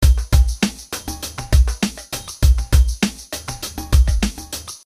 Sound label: Amen No Swing